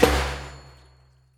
spingle Drum End; wild bazaar

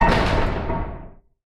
spin Button; rage of the seas

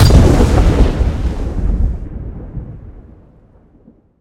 scatter Hit; riches of midgard

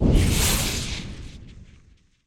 scatter Animation; multiplier mayhem

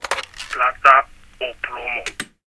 answer Phone; narcos

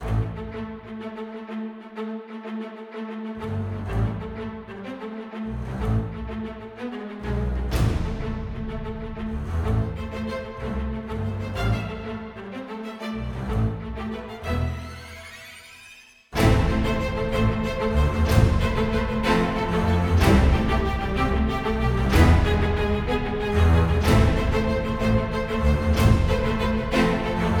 intro Music; robin hood

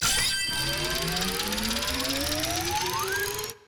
reel arrow; grandspinn

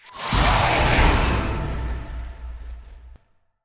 character Big Win End; archangels

